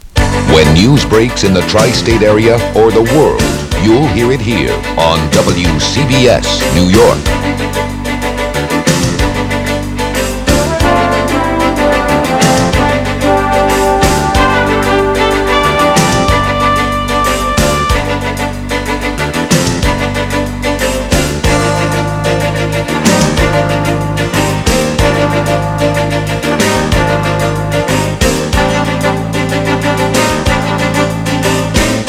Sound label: wcbs jingle